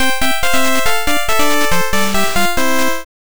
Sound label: jingle interval